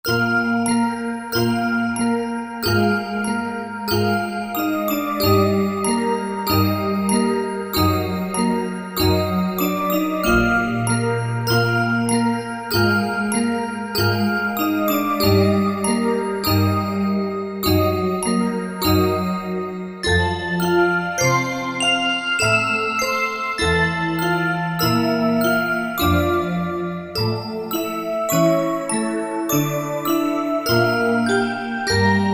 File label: Bellish Stuff, Korg TR-Rack